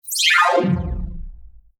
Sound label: Computer Games Sine Bubble Down Scifi Future Interface; Digital; Tom Hutchings